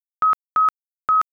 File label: 1273 Hz tone bark pattern